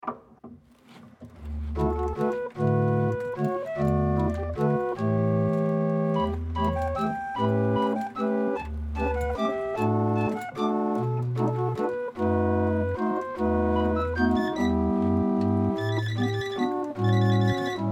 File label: Wind Organ Waltz, Barrel Organ; Berger